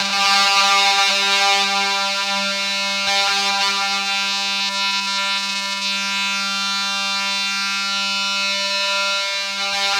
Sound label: ZWEEP, Synthy Tonal; Distored Reality